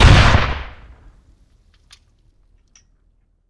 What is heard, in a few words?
rocket fire; weapons; valve